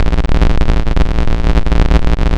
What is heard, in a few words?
Screen shake loop; high strangeness; wii u